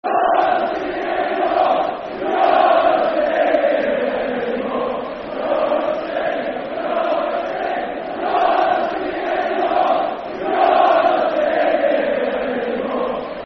You're Not Singing Anymore Football Chant (Soccer Song); Bradford City Bcfc